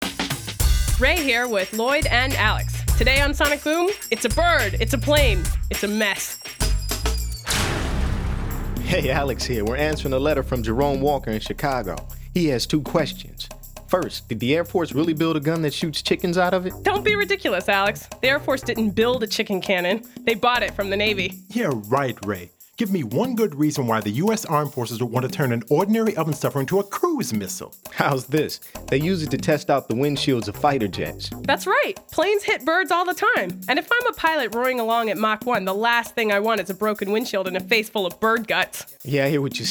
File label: Chicken Gun; sonic boom